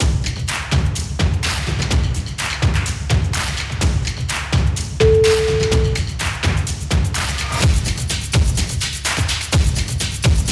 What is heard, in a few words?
kick boom clap